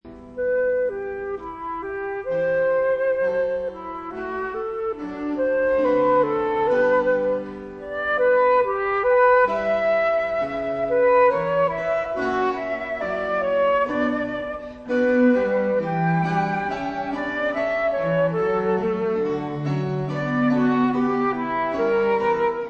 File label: sonata; j s bach sonata5